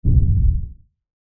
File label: Monster Footsteps, Horror, Monster, Footsteps, Lfe, Hit; Monsters; Blastwave